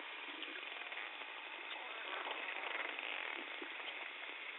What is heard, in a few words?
alarm like and clicks, note similar to a alarm sound